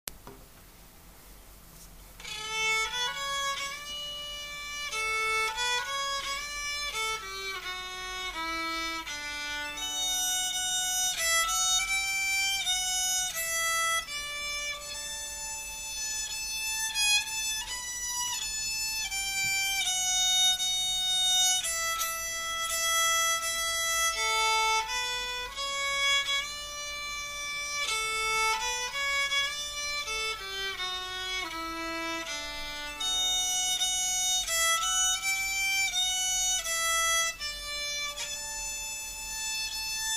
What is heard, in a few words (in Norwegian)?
Jämtlandsk brurmarsj langsom